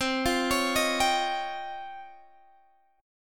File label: Db Major Sharp 11th C